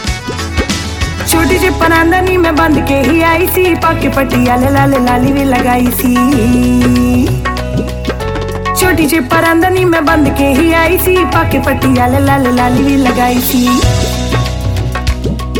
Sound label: hindi song ringtone